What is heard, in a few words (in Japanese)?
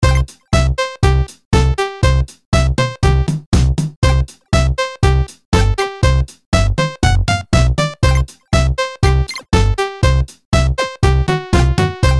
チップチューン スーパー loop 120BPM